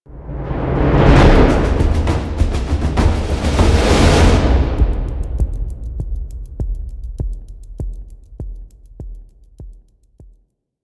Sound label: PVP Planet Match End, Music; Warframe; DE